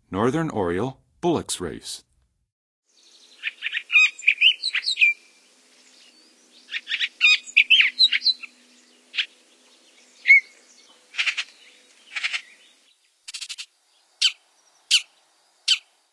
Northern Oriole Bullocks, Songs of Birds of North America; Western USA; Western USA Songbirds